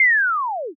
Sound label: whoosh down